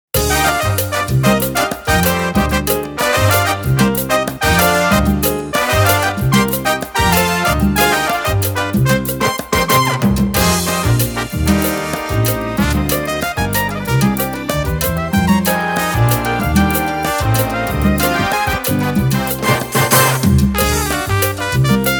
Latin Salsa

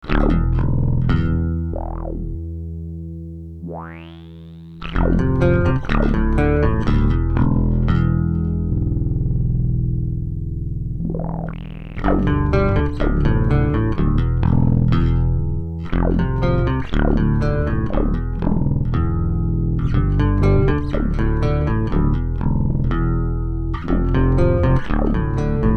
Synth Bass

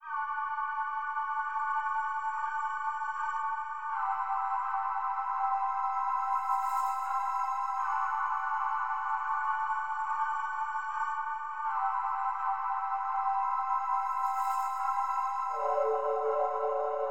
Glass Atmosphere

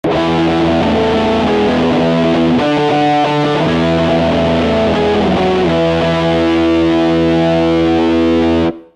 Dire Violin Singing Strat

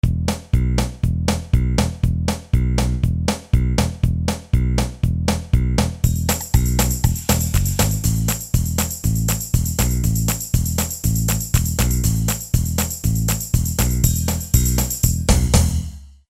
Polka; BOSDR670

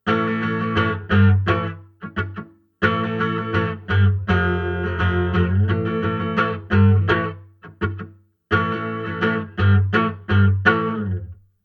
Strat Bridge PU Acoustic Wet; PerfectSpace

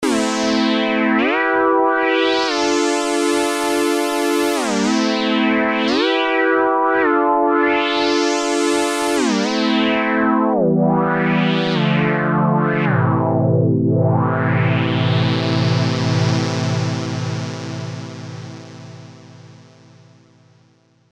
minor glider

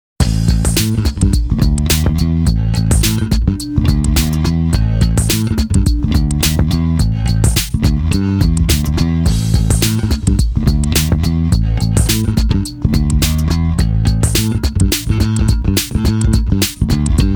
CS-3 Tone Bass

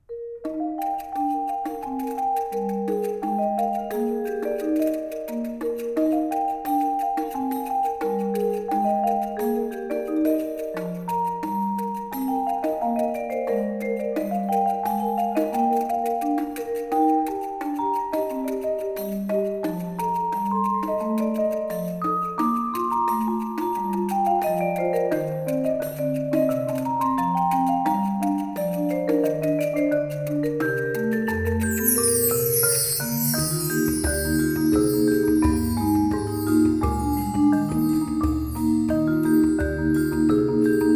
Percussion; R09HR; EDI R09HR

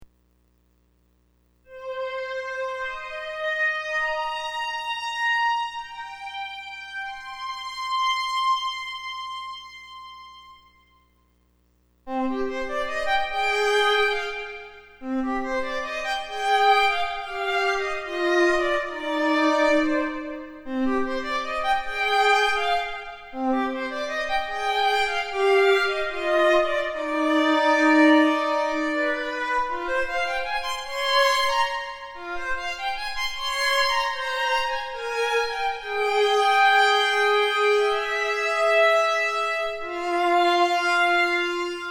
strings

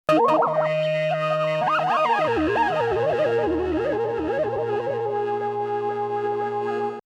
Arp

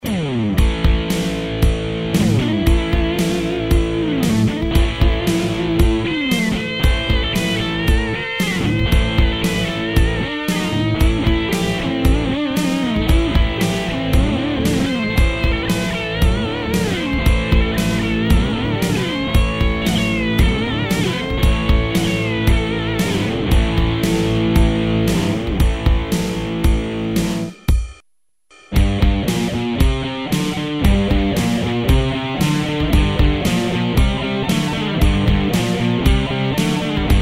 simple looping

